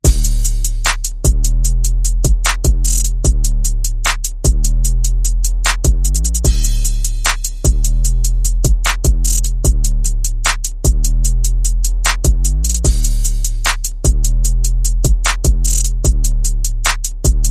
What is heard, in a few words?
Bass and Perc